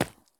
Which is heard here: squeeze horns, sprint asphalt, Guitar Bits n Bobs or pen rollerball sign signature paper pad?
sprint asphalt